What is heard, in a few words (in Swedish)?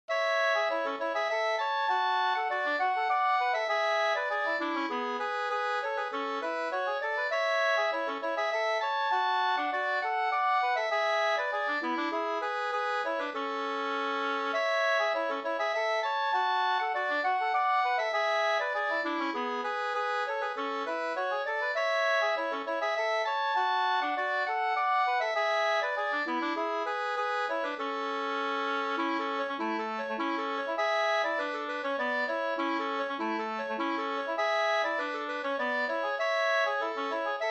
slängpolska